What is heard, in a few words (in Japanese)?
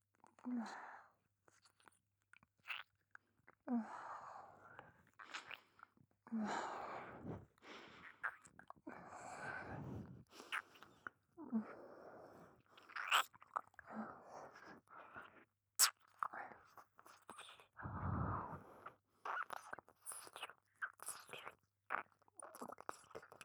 右 耳舐め; ミズキ